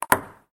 ping ringtone samsung sms

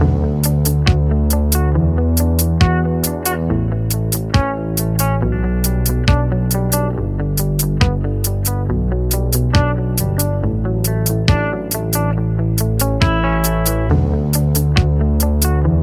calm ringtone

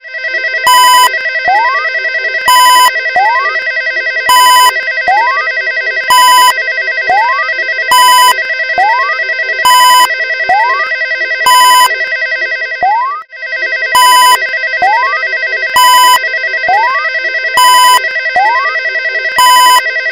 clock alarm ringtone

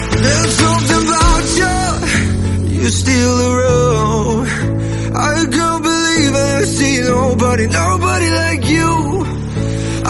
English song tone ringtone

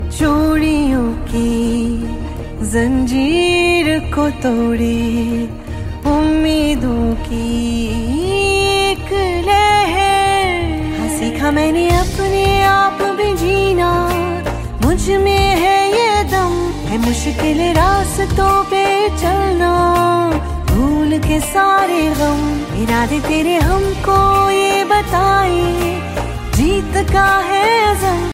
pakistani drama ringtone; sinf e aahan; ary digital

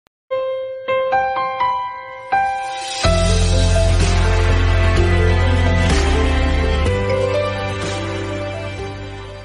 samsung s21 ringtone

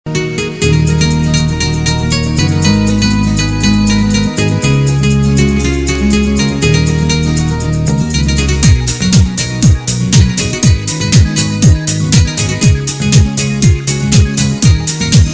it calls tone ringtone

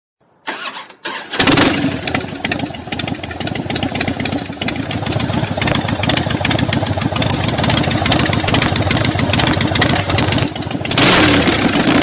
starting motorcycle sound tone ringtone

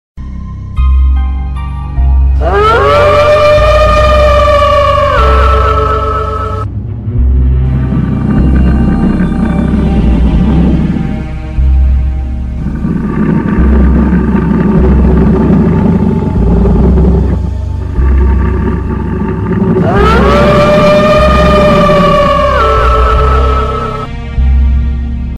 castle horror ghost ringtone

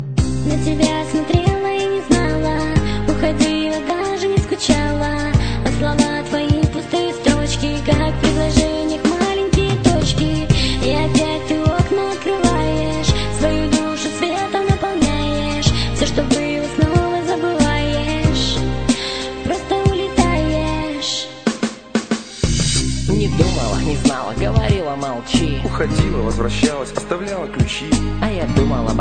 pop music ringtone

